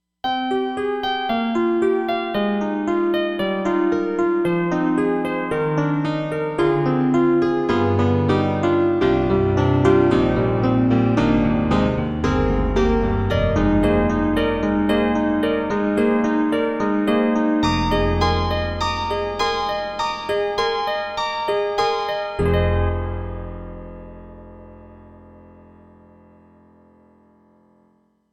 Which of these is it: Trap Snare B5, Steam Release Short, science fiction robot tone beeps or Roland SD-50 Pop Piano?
Roland SD-50 Pop Piano